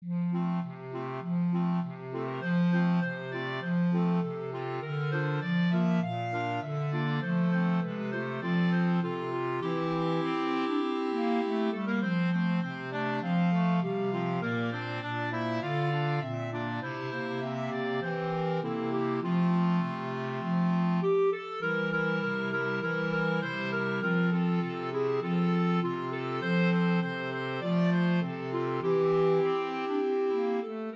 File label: clarinet quintet; Northern Set